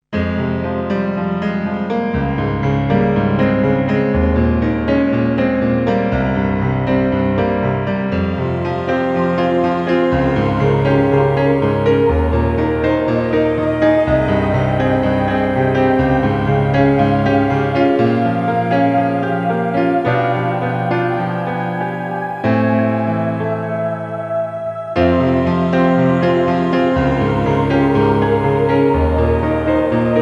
ambient

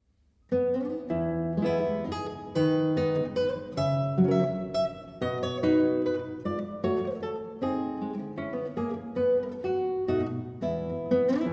traditional catalan; la filadora

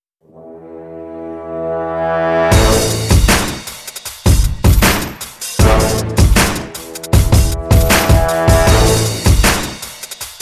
beats stem

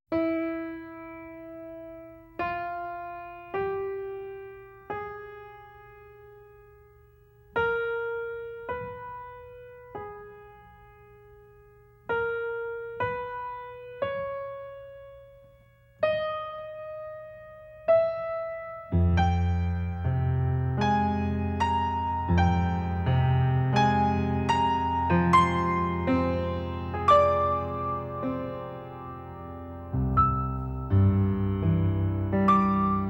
piano

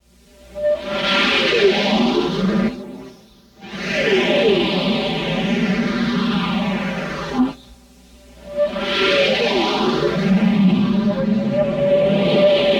pass bys with vocoder sound fx

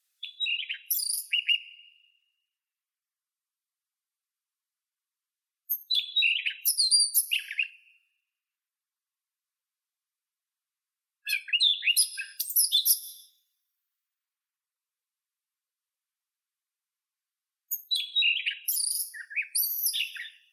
solovei krasnosheika luscinia calliope

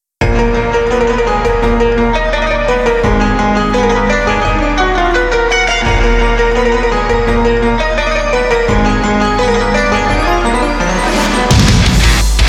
interlude instrumental

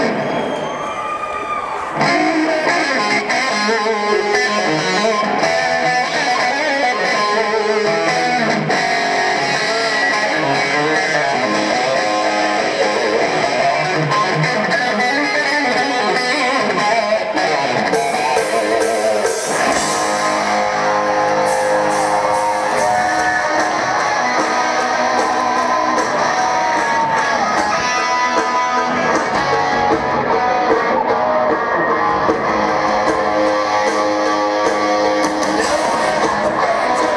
guitar solo

